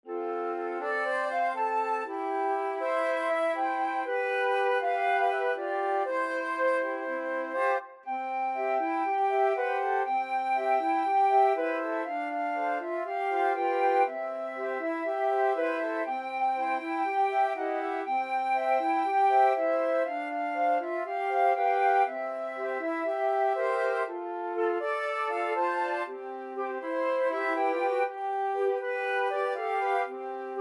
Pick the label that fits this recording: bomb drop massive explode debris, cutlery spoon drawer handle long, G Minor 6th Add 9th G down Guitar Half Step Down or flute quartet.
flute quartet